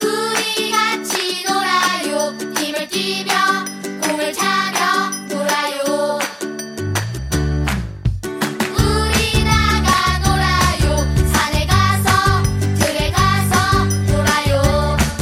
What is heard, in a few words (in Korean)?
영어 여 노래; NepaKids